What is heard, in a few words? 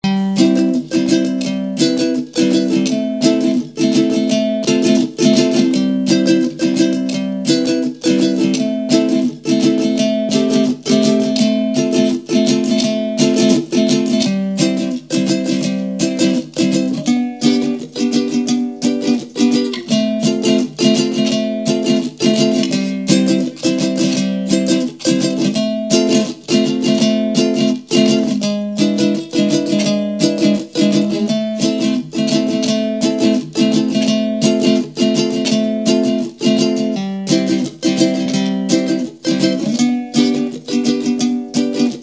Ukulele - (Instrumental Version)